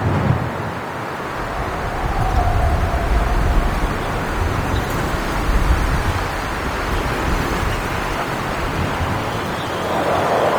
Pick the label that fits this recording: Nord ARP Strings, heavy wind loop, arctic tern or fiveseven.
heavy wind loop